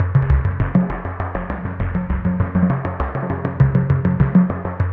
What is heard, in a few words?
ARP, SOFT BASS ARP; Zero G; Malice In Wonderland